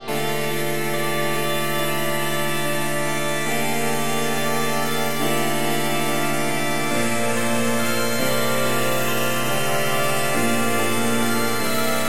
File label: swirling dreams